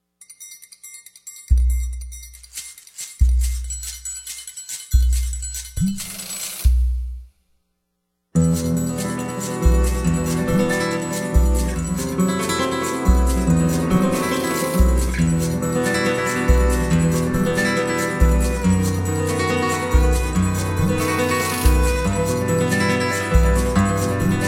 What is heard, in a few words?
etude, guitarist